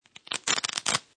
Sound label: Velcro Pull Apart; Crackling